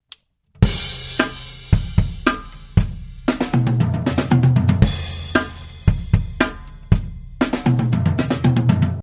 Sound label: Three Beat drum Fill